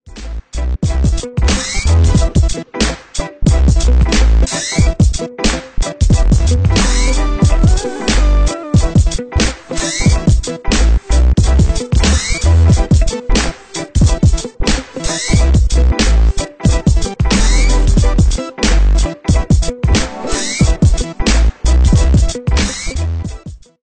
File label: Crazy Party